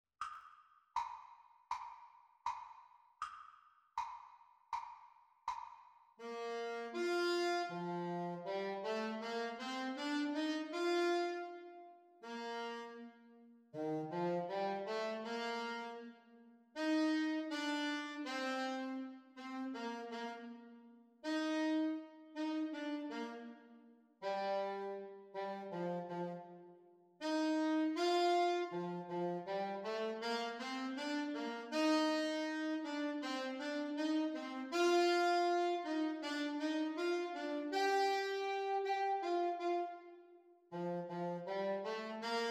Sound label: Sax Duets Playalong; Practice Speed